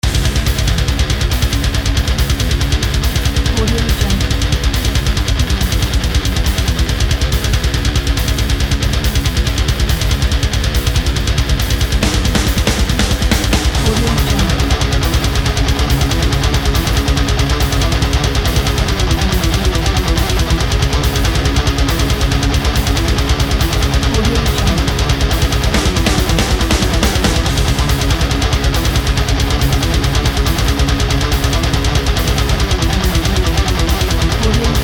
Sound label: tight thrash